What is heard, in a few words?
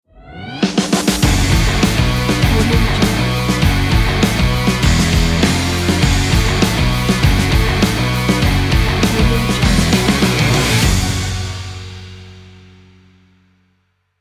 sport intro action opener